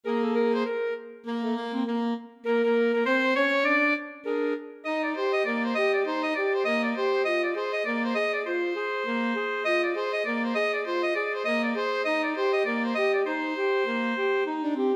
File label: alto sax trio